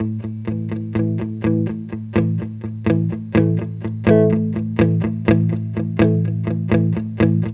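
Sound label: POLICE, guitar LOOPS; USB Soundscan vol.31; Miro s Grooves; AKAI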